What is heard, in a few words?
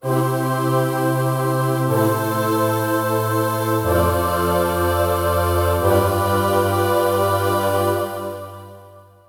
Choir